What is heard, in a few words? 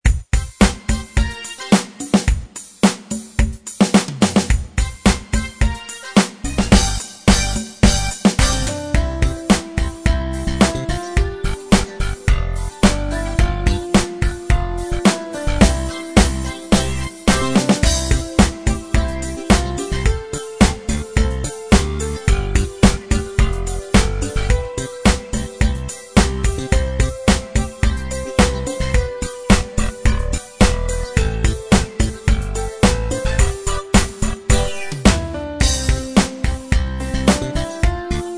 disco